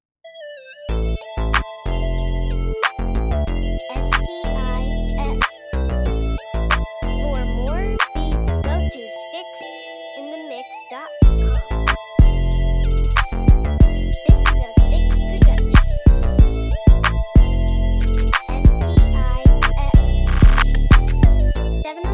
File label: Slide